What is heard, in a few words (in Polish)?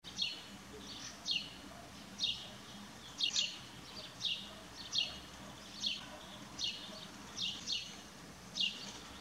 wrobel, ptaki